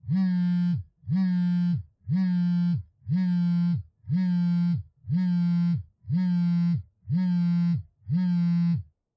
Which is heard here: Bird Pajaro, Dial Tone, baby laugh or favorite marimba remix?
Dial Tone